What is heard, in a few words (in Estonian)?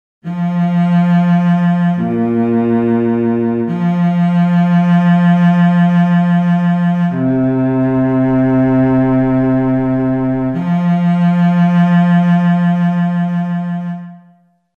diktaat bass